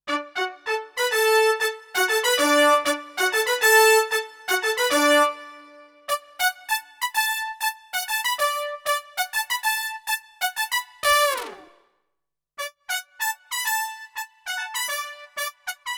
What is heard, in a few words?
Brass all; MODX